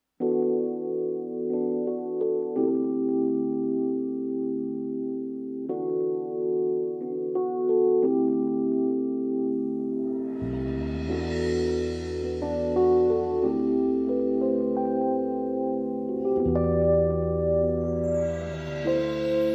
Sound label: MELLO GROOVE